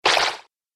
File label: App Game - Sticky Splat